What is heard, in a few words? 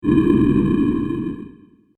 Cave Door Close, stone door; Stone Door Sound Effects; fantasy sound effect